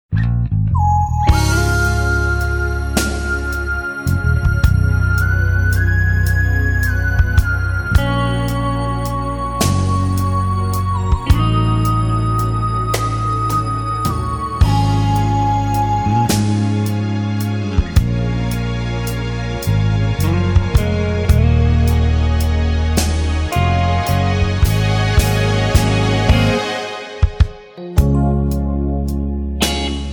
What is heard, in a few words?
brass minus